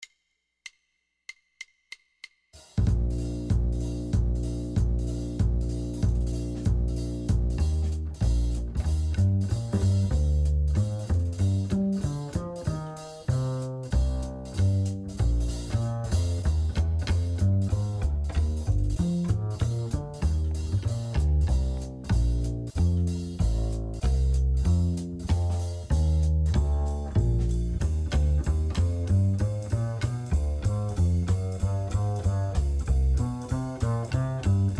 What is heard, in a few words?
Jazz PIANO GUITAR Play Along Up Tempo Swing